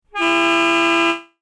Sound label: car horn